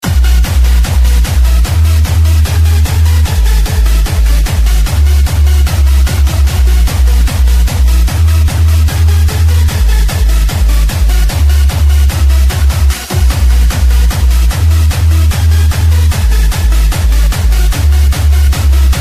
Jumpstyle Trance

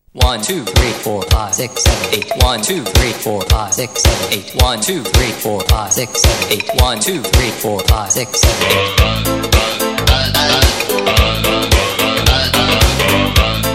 disco

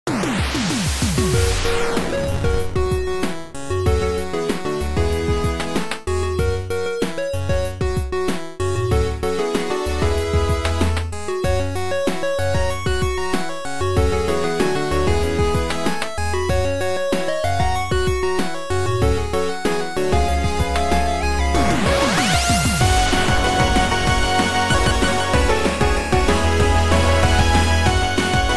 8-Bit; DnD Beyond

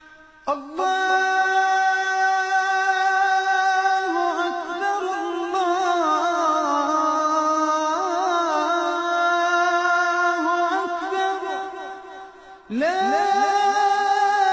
Azan Abu Dhabi

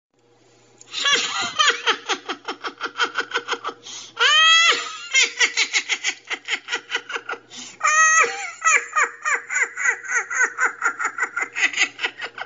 Laughing

